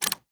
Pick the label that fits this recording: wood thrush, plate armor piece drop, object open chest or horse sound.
object open chest